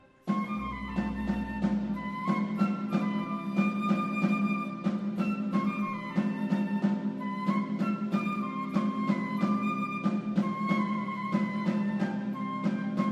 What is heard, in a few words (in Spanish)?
Flautin